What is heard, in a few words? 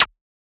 Perc, Percussion; FLPRO